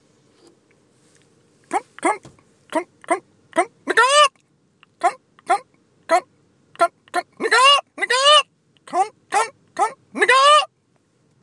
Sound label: offensive chicken